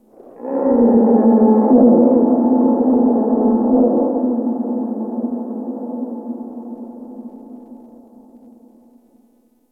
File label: Ice Creature Distant Cry; Warframe; Corpus Ice Planet